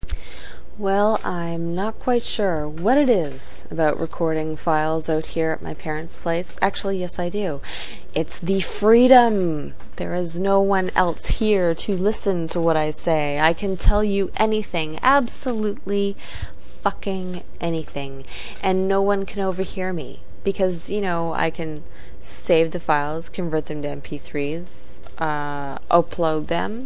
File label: audience